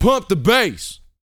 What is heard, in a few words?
VOX F, Vocal, R&B Dance; Spectrasonics Vocal Planet